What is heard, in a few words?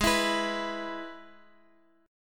Ab Minor Sharp 5th Ab, Guitar Open F; strummed; x,15,16,18,x,x; down